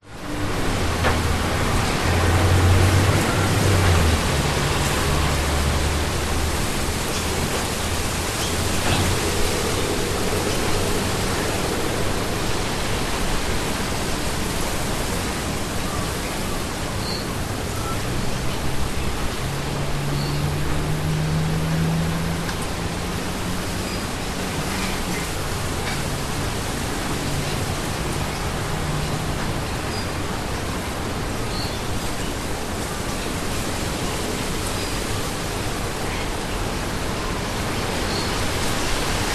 ambience suburban windy trees birds car short

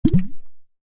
bubble pop

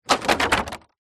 foley bottle plastic full drop roll ground

